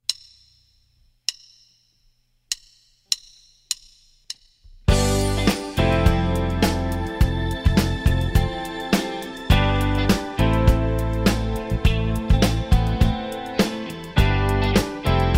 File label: with drums